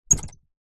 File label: household door hatch wooden plastic latch close squeak; zapsplat